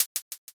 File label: hihat